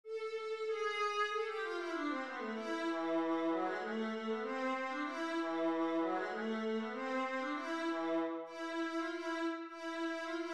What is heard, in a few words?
concerto a minor viola